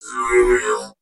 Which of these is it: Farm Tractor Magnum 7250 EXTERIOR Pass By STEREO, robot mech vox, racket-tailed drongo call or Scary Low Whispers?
robot mech vox